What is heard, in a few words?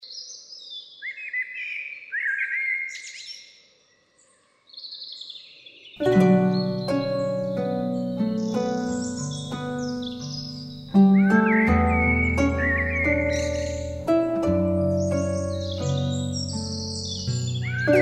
blackbird